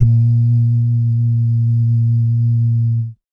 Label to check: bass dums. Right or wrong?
Right